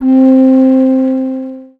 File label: Noises Whistle; Synthetic's Serum Collection; Production Master - Vital Future Bass 4